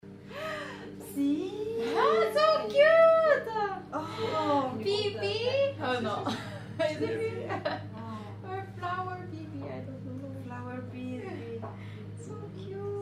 scream siiiii, super excited

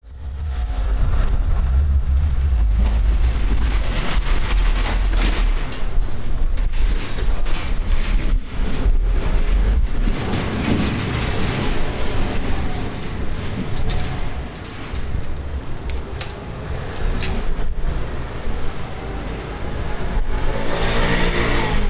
chinatown

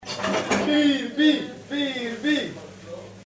screaming bibi! bibi!

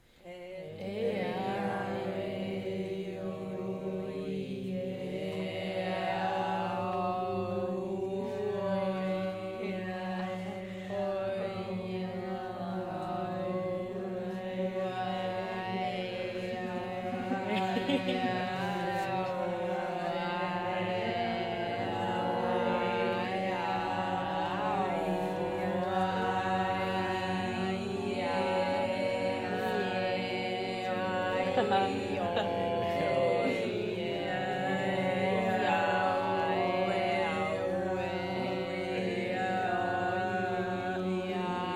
meeting choir; finearts

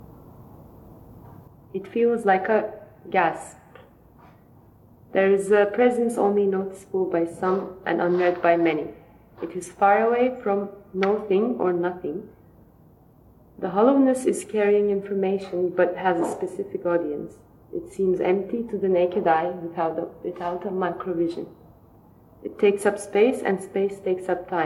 gasp

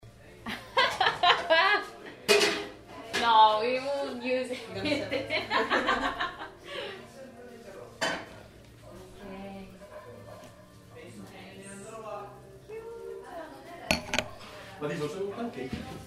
laughing and then nice sound atmospheric

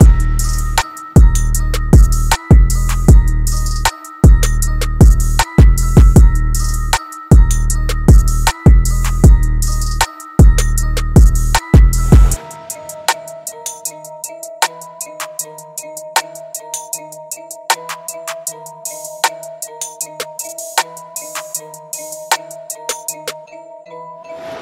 beat

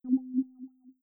error

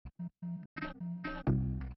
unlocked

